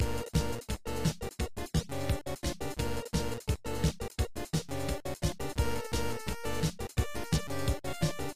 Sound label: music ingame loop; Gumball - Go Long!